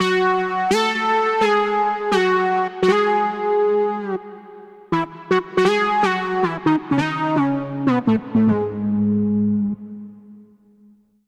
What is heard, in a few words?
12 Voice Brass C# Minor 85 bpm; MIDI Clips; Tonal; Melodic; Obee; Ableton; Factory Packs; Chop and Swing